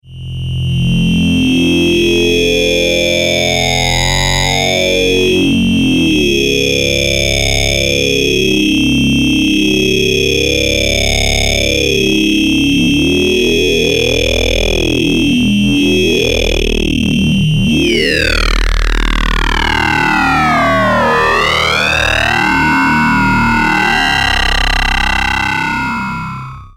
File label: wave